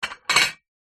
household stack of plates dishes set down on wood surface